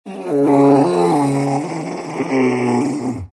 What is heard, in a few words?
big moans; Undying